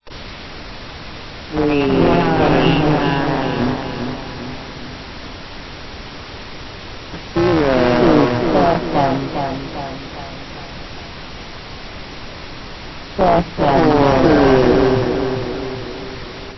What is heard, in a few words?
portal; nyanland